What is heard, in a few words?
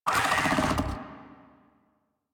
Catbrow Combat Vocal Attack Medium; Warframe